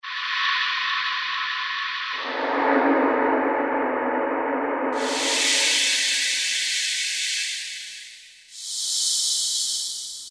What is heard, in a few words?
Noise, Korg T3